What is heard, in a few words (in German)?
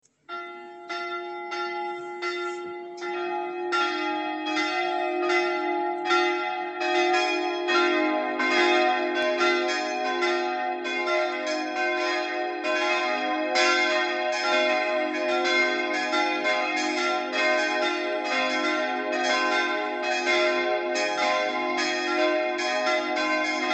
Glockenlaeuten